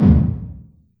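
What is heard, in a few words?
large room 909, reverb; TR 909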